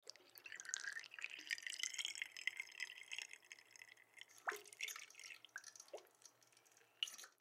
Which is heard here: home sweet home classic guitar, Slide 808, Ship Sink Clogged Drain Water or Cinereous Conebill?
Ship Sink Clogged Drain Water